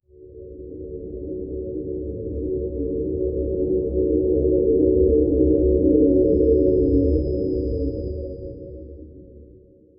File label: cinematic ocean; majestic